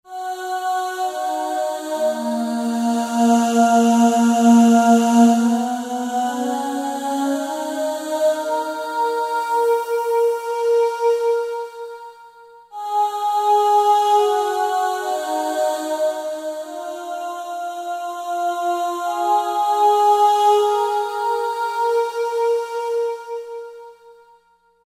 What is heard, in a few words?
Swirly Mist, Roland SRX-03 Studio SRX